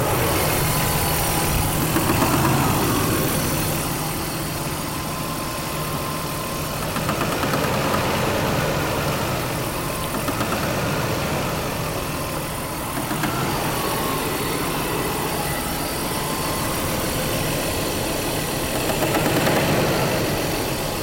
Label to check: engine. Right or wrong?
Right